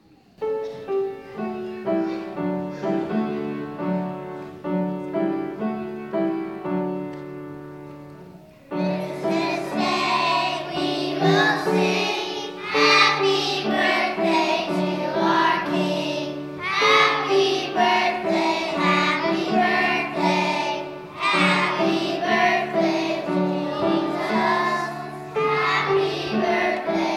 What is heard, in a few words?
childrens choir